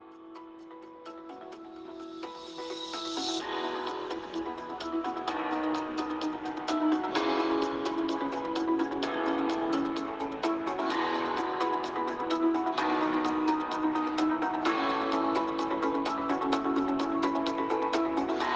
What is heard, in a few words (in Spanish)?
musica de fondo